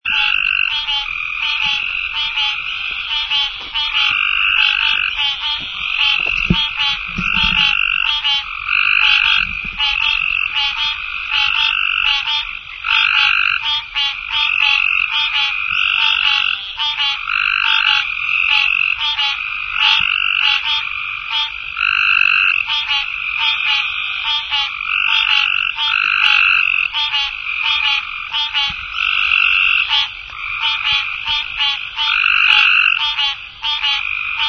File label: frog song